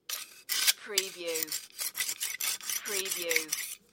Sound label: Knife sharpener, finish kitchen knife long